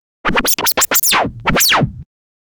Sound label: record scratch cartoon b; funny